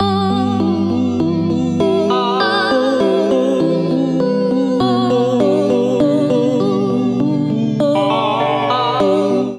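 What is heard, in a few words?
G Major 100 bpm, Transformed Voices; MIDI Clips; Chromatic; A Day In The Country; Ableton; Factory Packs; Voice Box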